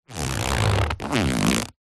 handbag leather strap slide movement hanging chair; zapsplat; foley